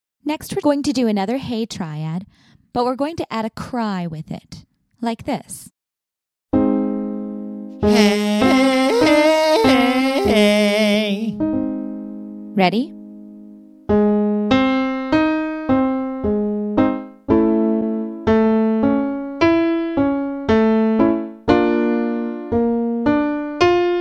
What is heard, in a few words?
Belt Hey Triad Cry